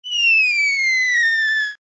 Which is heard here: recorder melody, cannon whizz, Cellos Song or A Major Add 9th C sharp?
cannon whizz